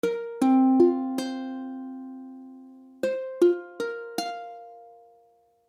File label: Fsus4, akordy ukulele